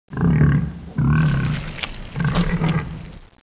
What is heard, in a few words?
voltigore eat; svencoop